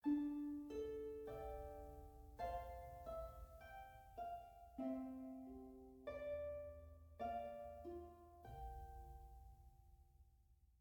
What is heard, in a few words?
harp; gamerip